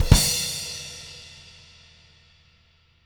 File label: DBLCW KIK -L, Living Drums, SD CYMBALS; Sampleheads; Peter Erskine